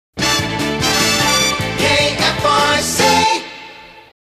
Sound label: Sung Once (Fast), Jingles; KFRC; TM Series